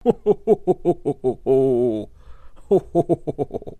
jolly laugh; santa clause

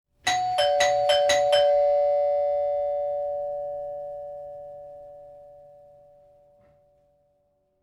Doorbell

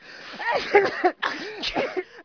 people

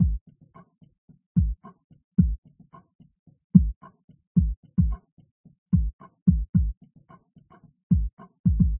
car stereo

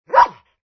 ANIMAL DOG

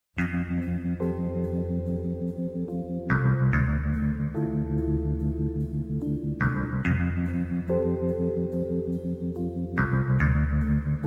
ringtones; series tv; twin peaks

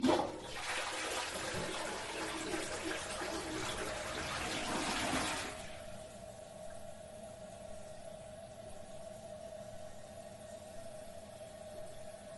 toilet flush refill

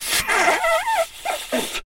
blowing nose nose

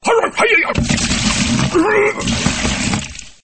puking; fighting

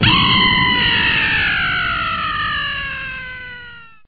falling off

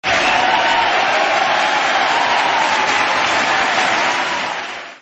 applause applause applause

